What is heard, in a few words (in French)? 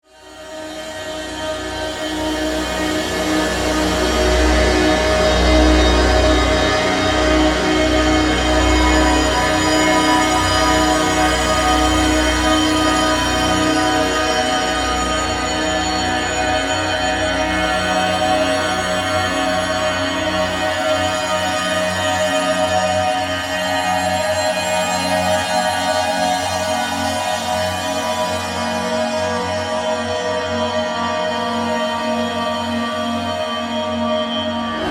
Version Electro